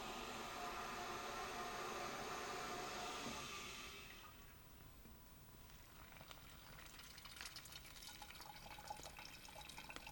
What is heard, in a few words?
coffee clean, coffee brewing